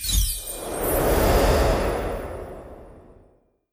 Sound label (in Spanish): Sonido futurista, Transiciones y Movimientos; Efectos de Sonido para Videos